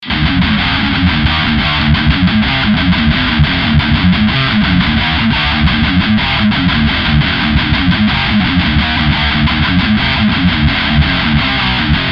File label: metal tone